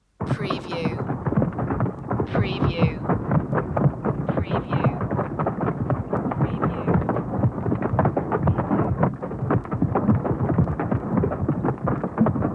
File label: Rhinoceros Herd Hooves